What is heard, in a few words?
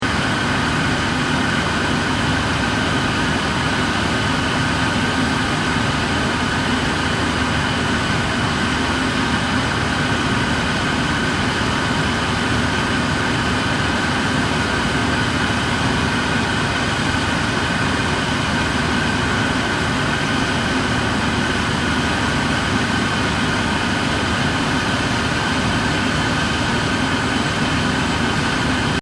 PLANT ROOM HOSPITAL WEST LOOP, Airconditioning plant machinery; Air Conditioner Sound Effects; Air Conditioners (Sound Effects:Household